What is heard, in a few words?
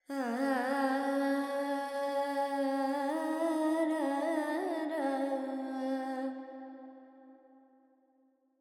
Verb Charukesi, Carnatic Female Alaps; Vocals; Sample Banks